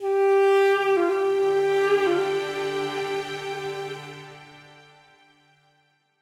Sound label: Palace Day Climb Stinger; assassin s creed chronicles india